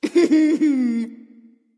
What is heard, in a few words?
tongue; cupid